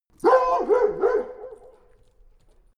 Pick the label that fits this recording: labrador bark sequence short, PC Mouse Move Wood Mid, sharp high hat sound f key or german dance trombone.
labrador bark sequence short